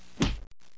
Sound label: thud; Mr. Wonder's Greatest Toyshop on Earth; OmniMedia